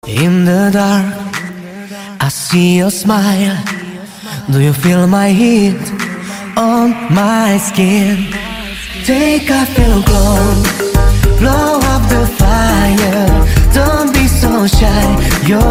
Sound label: VOICE remix